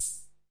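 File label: pops hi-hat, Korg MiniPops; drum machine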